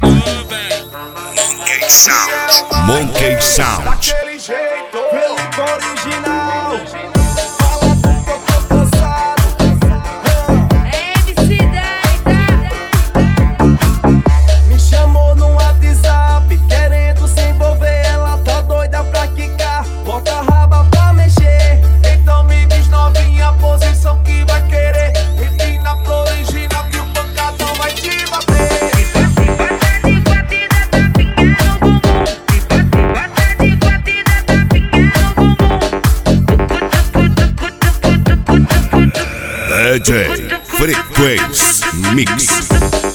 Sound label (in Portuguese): esp balada